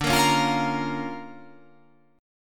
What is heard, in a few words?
D Minor Major 7th Sharp 5th, D